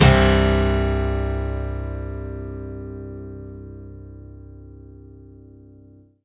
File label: Acoustic Guitar Impact; deputy dangle